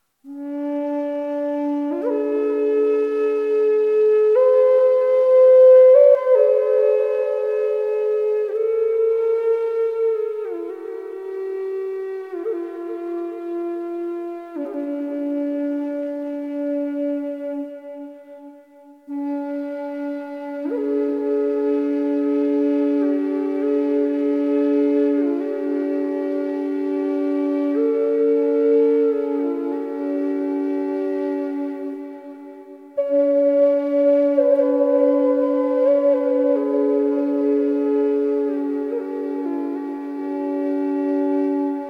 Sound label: D 432, drone flute; ahorn; d 432hz